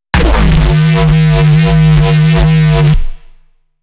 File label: reese, bass